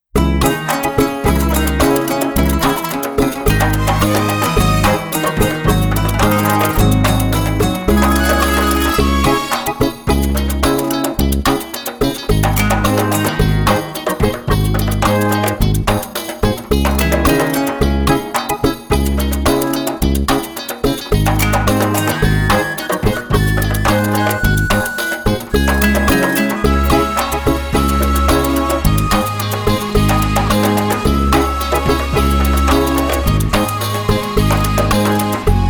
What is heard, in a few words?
music only